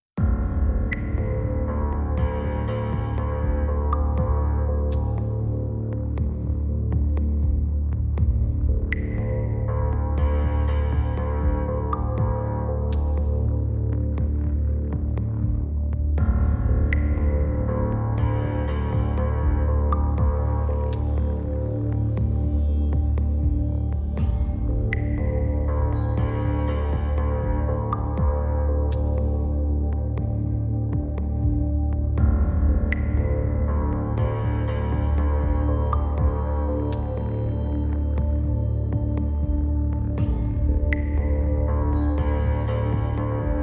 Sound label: Near Threat Without Piano